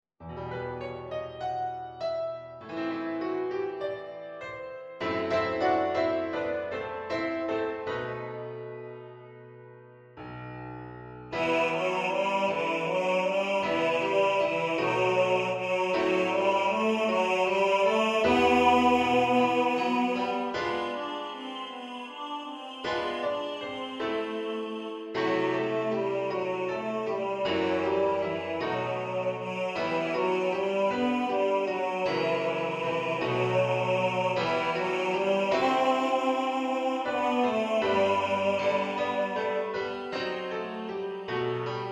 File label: BASS